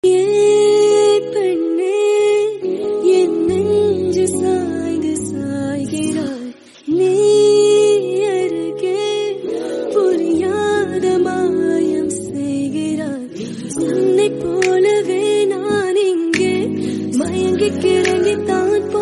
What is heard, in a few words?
Female Version Tamil